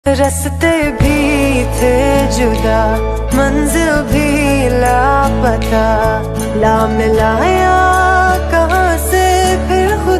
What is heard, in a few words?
OST pakistani ringtone; Yunhi OST